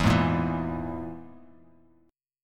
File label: E Major Flat 5th E, Bass Standard; strummed; JGuitar